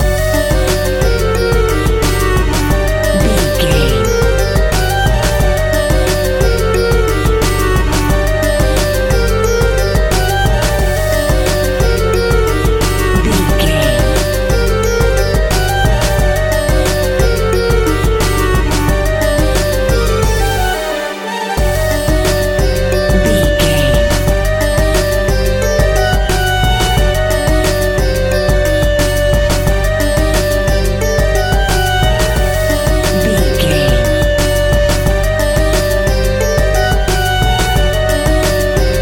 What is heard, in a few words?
north indian ambient music; North Indian Ambient Music. An exotic and colorful piece of World music. Groovy, powerful, exotic and wild